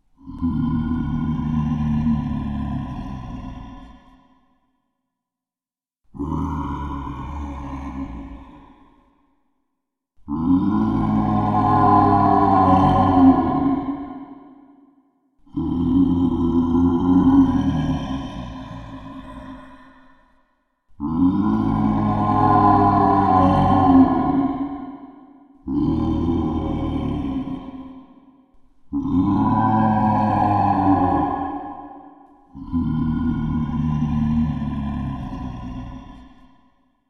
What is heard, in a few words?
Roar Into Can With Effects